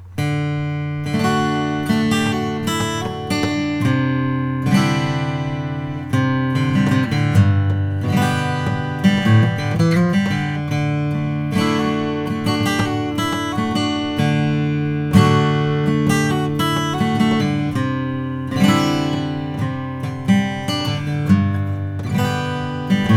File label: Froggy Bottom M Deluxe rosewood